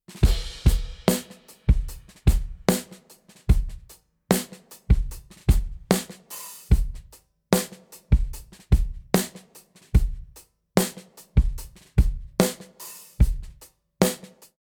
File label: drum recording, slow funk; schlagzeuger; drumstudio hamburg; musiker